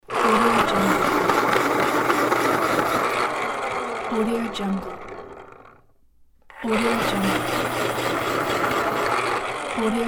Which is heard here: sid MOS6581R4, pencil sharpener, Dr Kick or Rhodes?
pencil sharpener